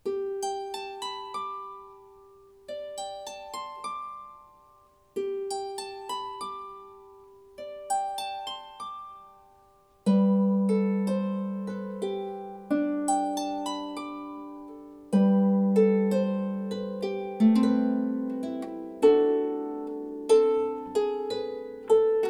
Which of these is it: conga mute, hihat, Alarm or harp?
harp